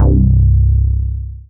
Single Electric Basses, Dance Floor Bass

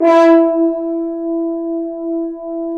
BRS, FHns Accent; Roland L-CD702; Accent-Swell

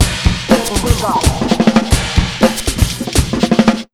beat, Electro 125, Big Beat Drumloops

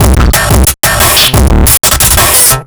Brutal Funk, Drum Loops

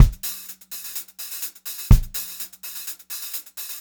126 HH, Pop-Rock Drumloops; USB Soundscan vol.37; AKAI

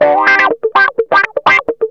GUITARS 125

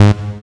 SYN POLY; Sound & Vision - Gigapack I CD 2; JD 800/990

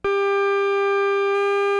CX3 OVDRV, CX-3 organs; Greytsounds

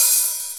RY-10, DRUMS + PERC, YAMAHA; Rubber Chicken Software Sample Bank

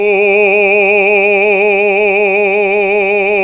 BASS, Roland; Sound & Vision - Gigapack II CD 1; GREG. BASSES

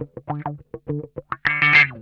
OUCH, Funk Guitar; Zero G - Funk Guitar

